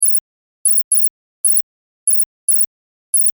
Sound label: Science Fiction Computer Readout High Pitch Beeps; Computers